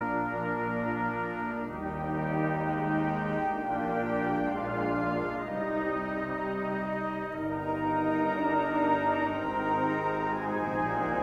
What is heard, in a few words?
Last Post, Band; Welsh Guards band